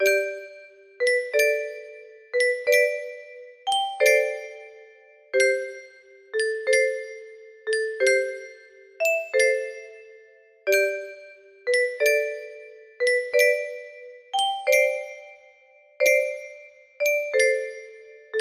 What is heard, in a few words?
lullaby, music box